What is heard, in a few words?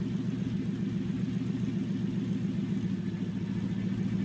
Goblin Uber Loop